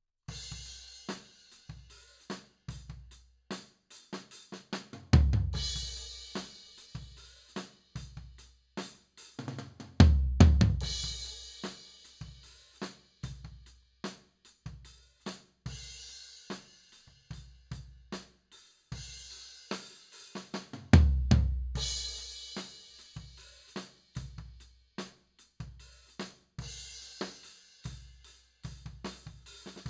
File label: Floor